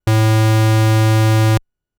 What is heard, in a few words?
Sub A3, keys; fleet keys Samples